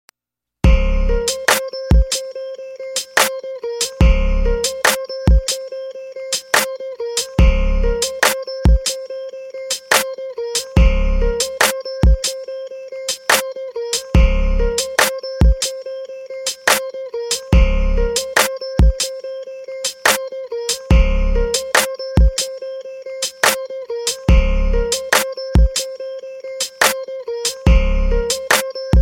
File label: instrumental ringtone